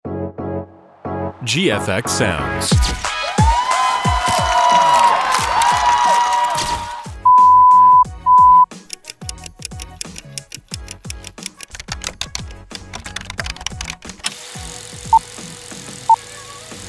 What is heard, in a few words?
Podcast studio sfx pack